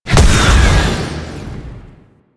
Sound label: Incarnon Impact Small; Weapons; Warframe; Duviri; Incarnon Adapters